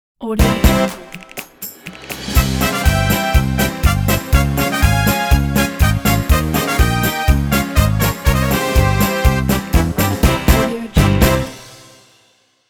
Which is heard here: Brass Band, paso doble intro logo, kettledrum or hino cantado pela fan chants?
paso doble intro logo